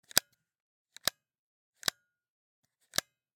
Camera, Pentax KS2, DSLR, mechanical, flash, close, snap, multiple, XY; Eiravaein Works; Vaeyan IV